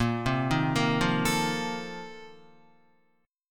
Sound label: A sharp Major 7th Suspended 2nd Suspended 4th, Guitar, chord; A#M7sus2sus4; arpeggiated; JGuitar